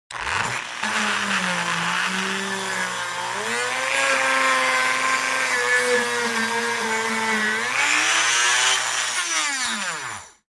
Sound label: Dremel tool grinds wood at variable speeds, then shuts off.; Tools, Hand Grinding, Tool Motor, Tool